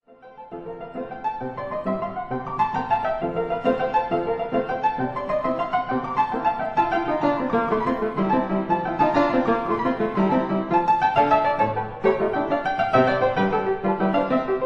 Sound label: Etudes for piano, F major